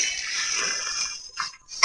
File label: growl